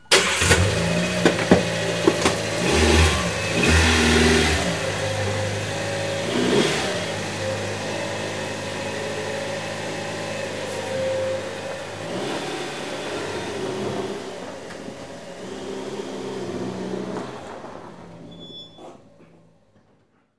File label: Car Driving Off From Garage